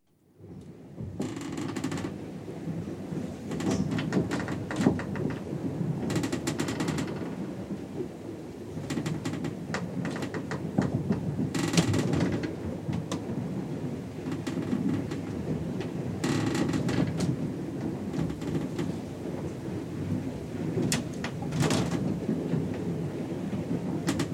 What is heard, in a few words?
Ships Sailing, Thames sailing barge, atmosphere below deck under sail, with creaking wood; Recorded on board SB Pudge; Boat; BBC